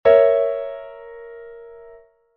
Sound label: A♯°, Chord Inversions